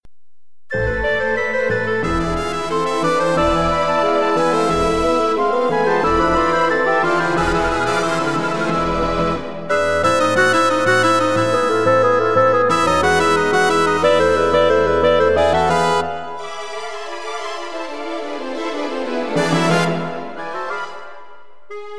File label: Allegro for Small Orchestra